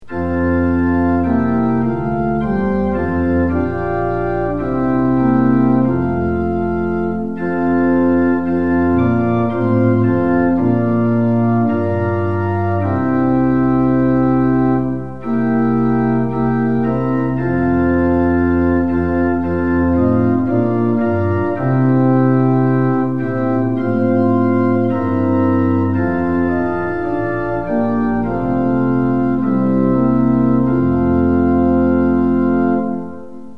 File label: tune; hymns; lillenas